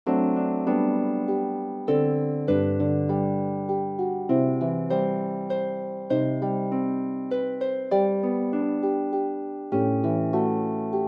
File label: Harp; Famous Music for the Harp Vol 6